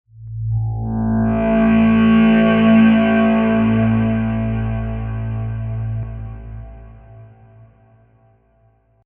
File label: Synthetic Swell